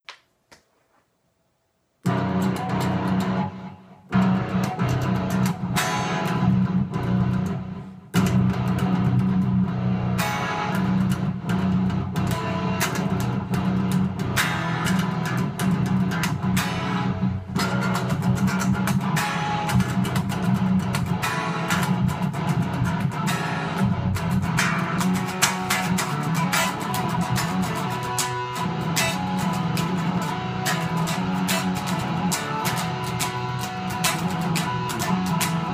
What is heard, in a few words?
Octane Octaves